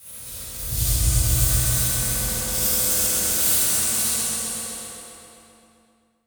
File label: Transition Stab Dark Metallic; Production Element